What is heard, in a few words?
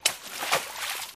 FS Stream, singles water